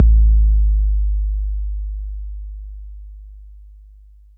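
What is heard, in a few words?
bass drum 808 Smooth C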